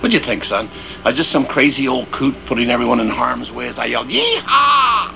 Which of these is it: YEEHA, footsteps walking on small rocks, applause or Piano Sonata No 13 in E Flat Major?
YEEHA